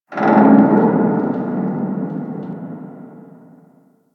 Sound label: Infested Distant Metal Creak; Warframe; Corpus Infested